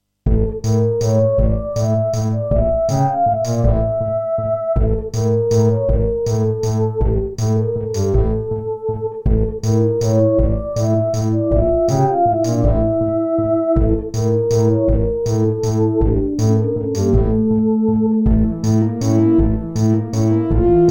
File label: Serdaco McFly